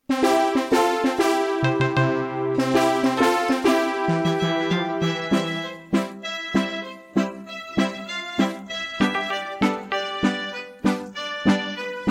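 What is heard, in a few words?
backing track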